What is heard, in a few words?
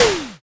blood splat